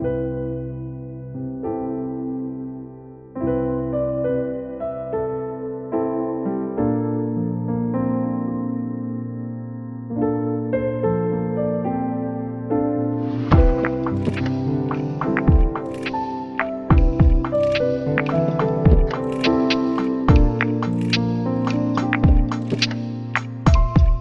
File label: sleepy